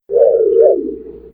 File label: Scanner, Lock Chime; KITT